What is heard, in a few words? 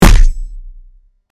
normal punch